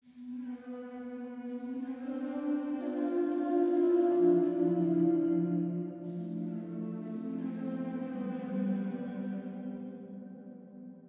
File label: Stingers Etheral Aurora Pad